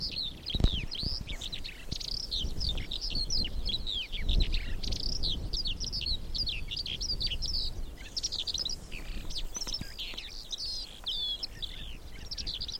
suara kicauan mongolian lark

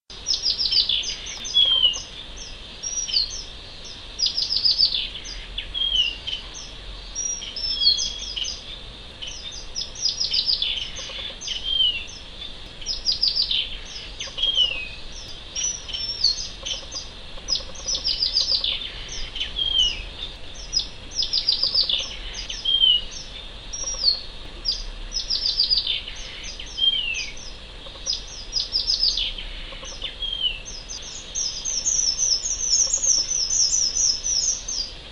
suara strawberryfinch betina

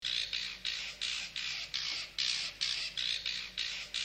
varian lagu burung cendet

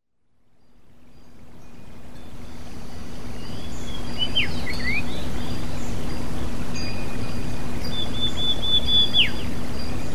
gemericik air dan kacer

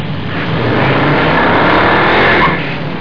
suara mobil

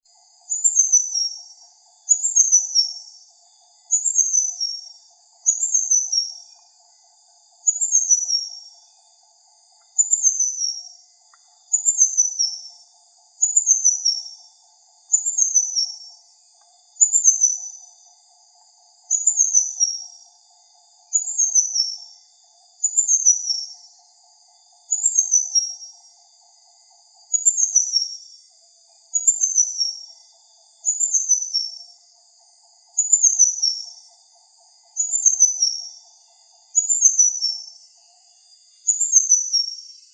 burung madu ekor merah

